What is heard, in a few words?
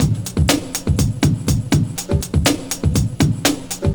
Lo Fi Loop, Drums & Percussion, Electronic; Sonic Foundry (Sony Creative Software) - Electronic Point Blank